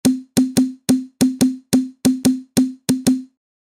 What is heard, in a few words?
pop